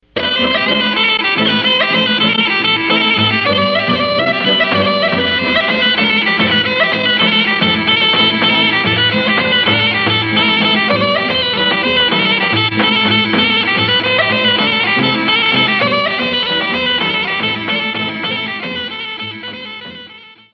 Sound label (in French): Horo - Bulgarie